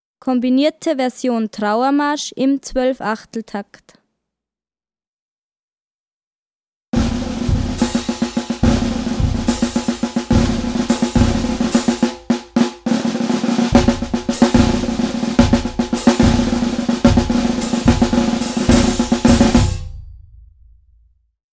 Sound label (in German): Trauermarsch 12/8 Takt; Kombinierte Version